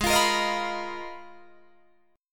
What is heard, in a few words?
Ab Minor 6th Add 9th, Guitar, DADGAD; 18,20,20,20,20,21; down